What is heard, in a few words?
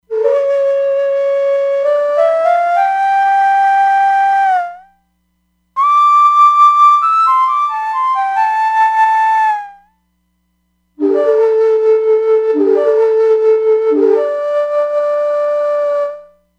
Ney Flute, E-mu Proteus-3; Factory patches